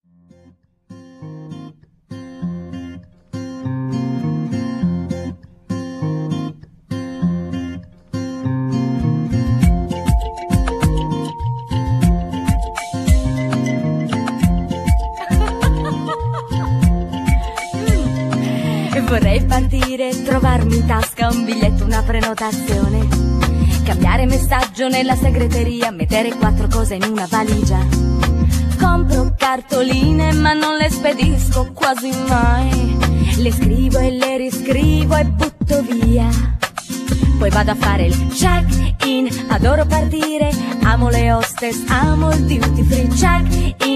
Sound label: eurogroove